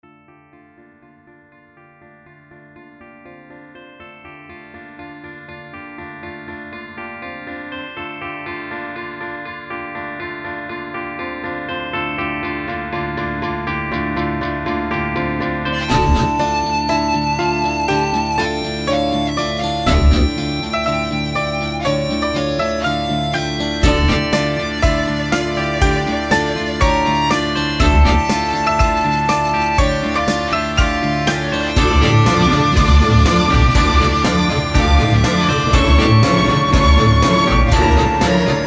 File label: Distorted Flute